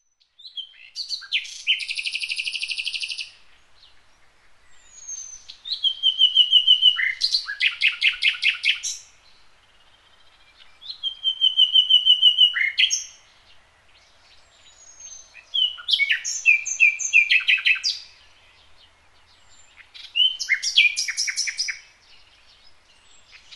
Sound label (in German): vogelstimme